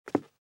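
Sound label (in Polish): kroki pojedynczy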